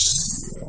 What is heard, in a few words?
Electro FX; Ueb HE Electro FX